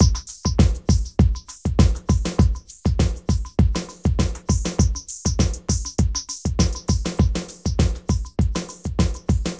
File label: KISS MY ACE 100, LOOPS